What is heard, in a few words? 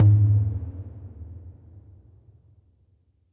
Udu Color